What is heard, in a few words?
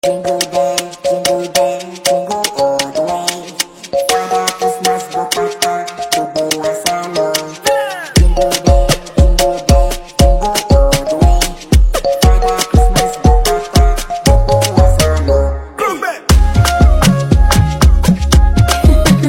ePiano Jingo Bell